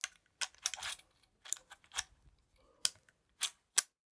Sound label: lever action rifle magazine